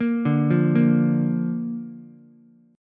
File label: A sharp Major, Ukulele; arpeggiated; chord; JGuitar